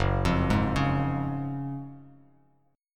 Gb Major 7th Suspended 2nd, down, Bass Standard; arpeggiated; JGuitar

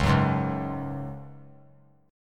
C sharp Suspended 4th; strummed; 13,13,11,11; 3C,3F,3A,3D

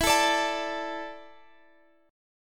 E 7th Suspended 4th E down, guitar; strummed

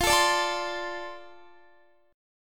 F 6th F down Guitar; F6 strummed

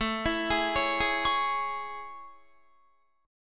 A Major 7th A, Guitar; AM7; arpeggiated

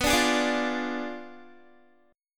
B Diminished 7th B Guitar; strummed; 4F,4A,5C,5G,5C,6E

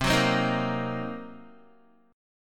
C Minor 7th Flat 5th, C, Guitar; Cm7b5; strummed

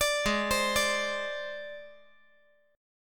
G sharp Major Flat 5th, chord; G#Mb5 arpeggiated; JGuitar

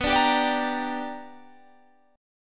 B Minor Major 7th Sharp 5th, chord

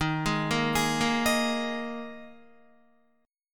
Eb Suspended 4th Eb Guitar 4F 4A 5C 5G 5C 6E; Ebsus4 arpeggiated; JGuitar

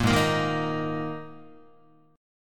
Ab Suspended 2nd Ab down Guitar; strummed; JGuitar

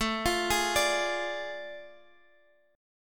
A Major 7th Suspended 4th Sharp 5th

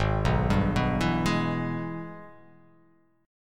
G sharp Minor Major 7th Sharp 5th G sharp down

